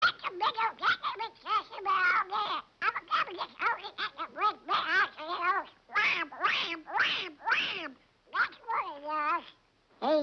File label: ngoac ngoac ngoac